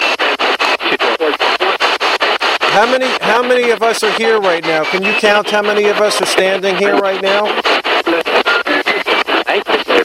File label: kick foot